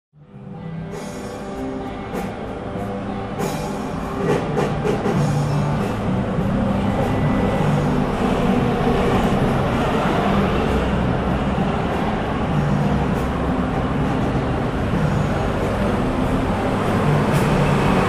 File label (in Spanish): calles; jujuy y richieri